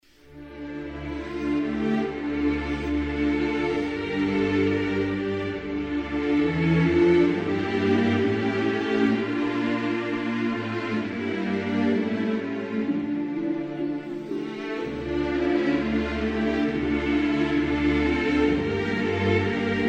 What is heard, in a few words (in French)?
Israel, Hymnes nationaux